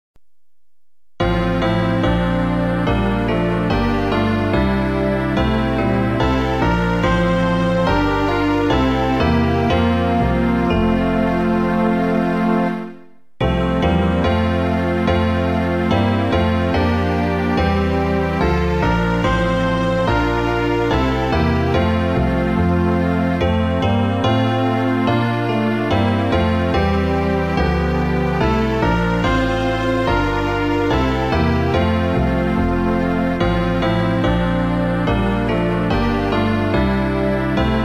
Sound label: Christian Hymn Infant Holy Infant Lowly